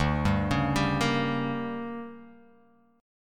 D Major 7th Suspended 4th Sharp 5th, Guitar Open F; DM7sus4#5; arpeggiated; JGuitar